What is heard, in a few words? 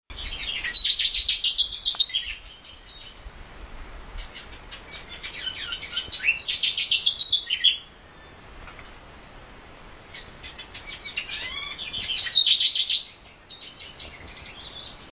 troglodytes aedon eggleston dominica; West Indian